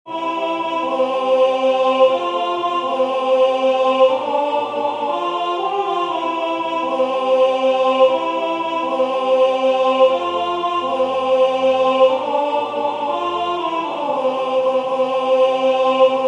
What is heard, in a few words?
Intro alto